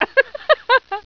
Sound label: LAUGH